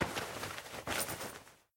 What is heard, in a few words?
Pocket